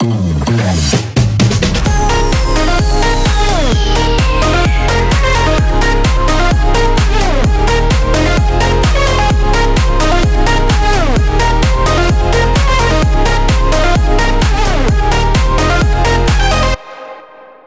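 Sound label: progressive house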